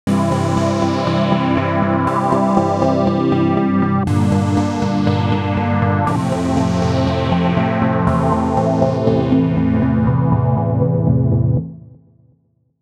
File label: bounce